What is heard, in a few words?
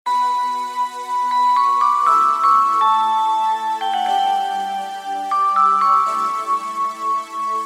jingle; megaman x5